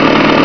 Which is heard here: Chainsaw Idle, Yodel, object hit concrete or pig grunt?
Chainsaw Idle